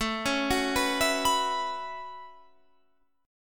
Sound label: A 6th Add 9th A down Guitar Half Step Down